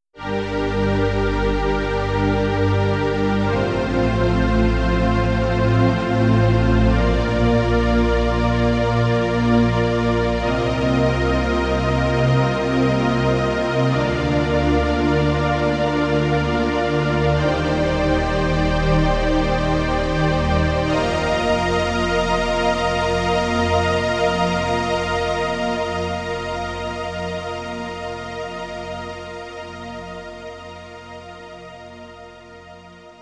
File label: Synth Strings